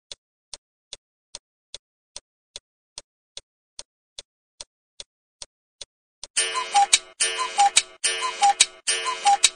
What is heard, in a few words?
Cuckoo, alarms